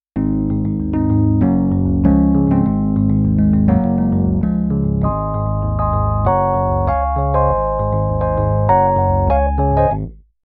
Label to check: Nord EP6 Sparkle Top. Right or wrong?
Right